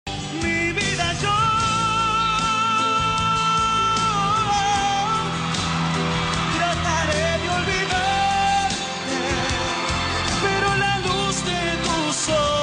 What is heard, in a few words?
Ska